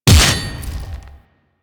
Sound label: Hammer Glass Impact Default A; Warframe; Weapons